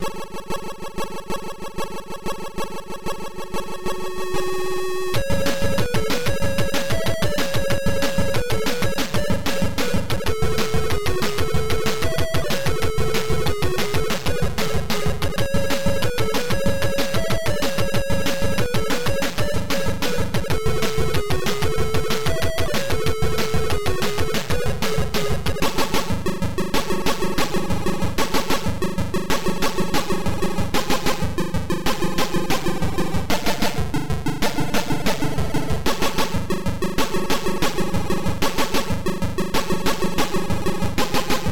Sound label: Level 1; Galactic Tomb; ESP Soft; John McKlain; StArkos